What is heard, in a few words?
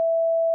step completion long; GameTime